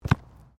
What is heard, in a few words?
SOCCER BALL DROP KICK; Soccer ball sounds; Sports